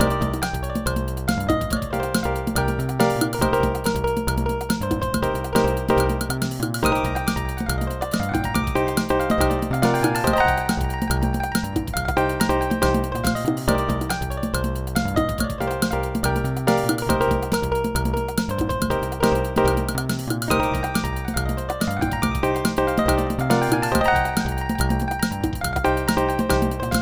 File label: solo; Dr. T's MIDI Recording Studio